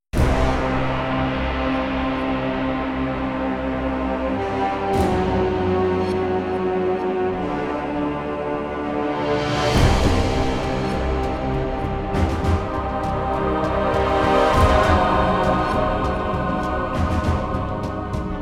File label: Menu Music Example